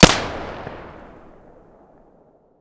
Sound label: pm muzzle stereo, weapons